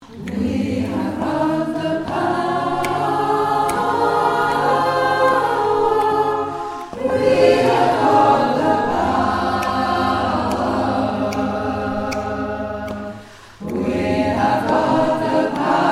all soft

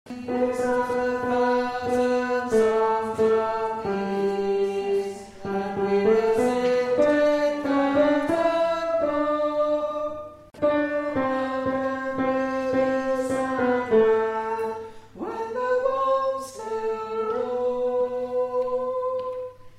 tenor

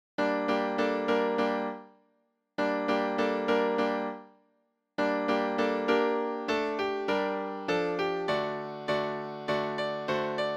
Midi

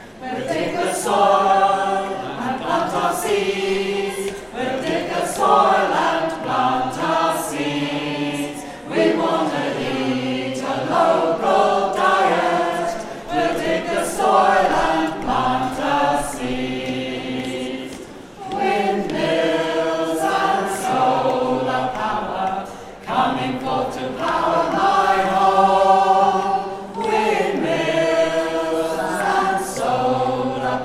Medley; Transition Town; Greenfair 2016

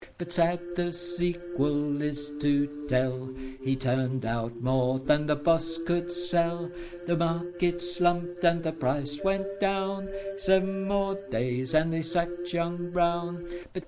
Bass Voice

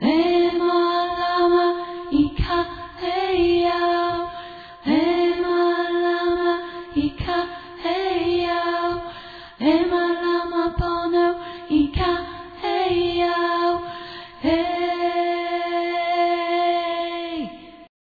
alto; manchester

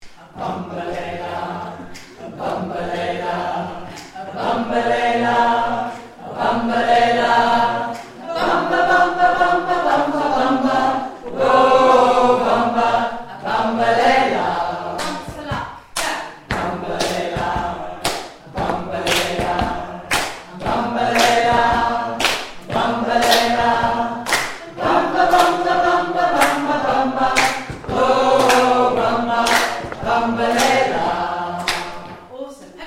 Bass

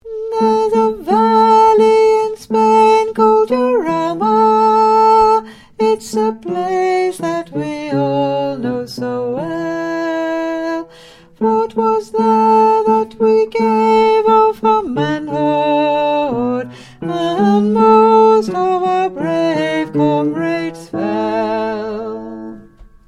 Bass

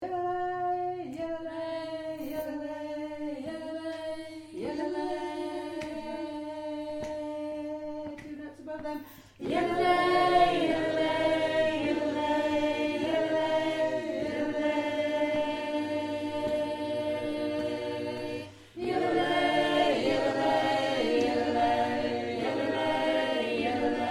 Alto

